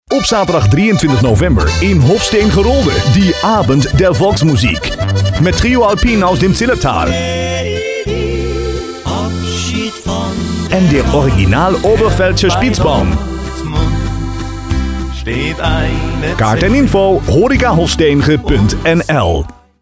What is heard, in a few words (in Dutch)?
jingle feestavond